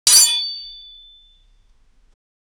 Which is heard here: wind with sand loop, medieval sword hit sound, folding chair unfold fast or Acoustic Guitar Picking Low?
medieval sword hit sound